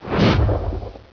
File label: Die